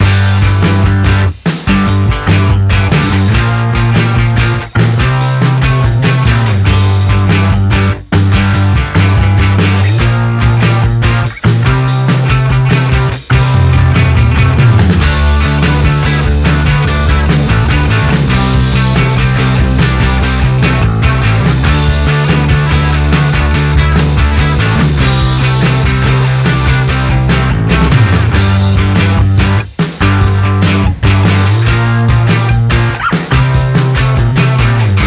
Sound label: Menu Music; golazo